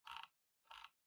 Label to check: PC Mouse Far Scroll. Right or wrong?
Right